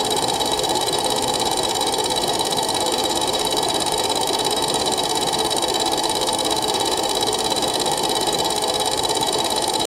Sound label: casino